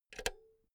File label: insert cinch heavy